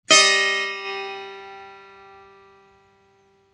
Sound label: setar mi b